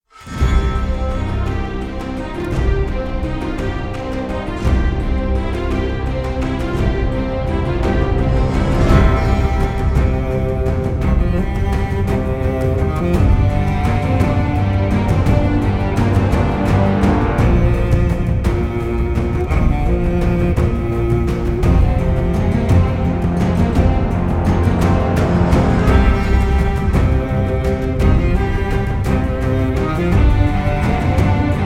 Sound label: Orchestra Choir Main Titles